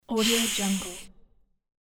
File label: cat hiss